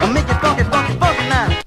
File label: i'll make it funky funky now SUNG